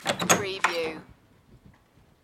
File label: Door Open